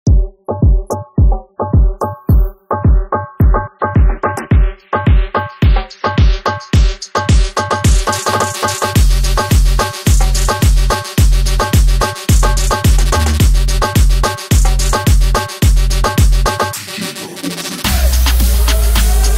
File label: karnay fx moombahton; milliy music